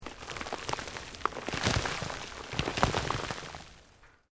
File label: EARTH LOOSE MOVE LONG, Debris